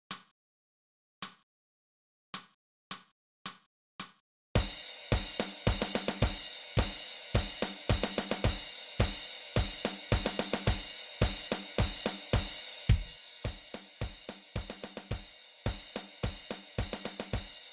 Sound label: Snare